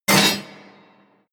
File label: sword armour impact